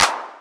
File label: clap, percussion